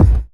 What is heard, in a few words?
BDRUM; MLOP DRUM; E-Lab; X Static Goldmine 2; akai